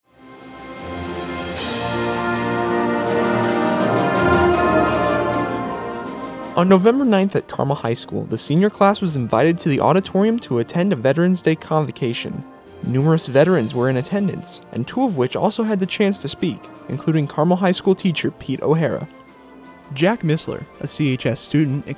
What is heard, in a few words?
beat